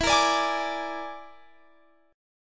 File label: Bb 7th Flat 5th E